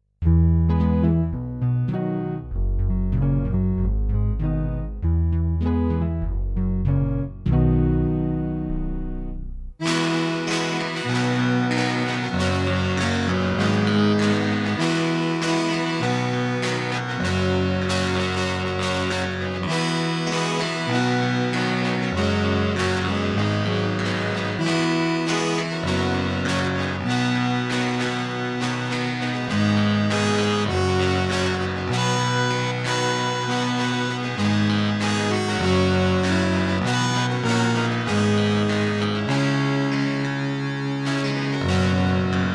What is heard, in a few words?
insane tune